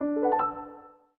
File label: Unlock Acoustic; LG K83 5G